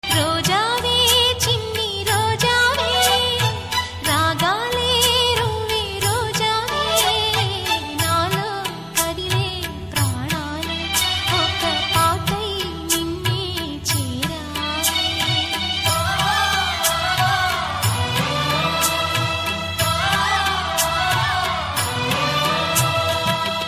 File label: BGM Humming Ringtone